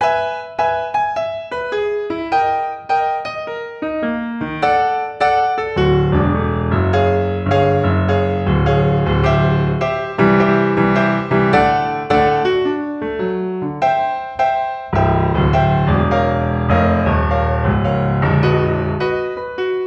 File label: Pop Etude